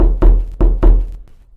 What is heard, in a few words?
knock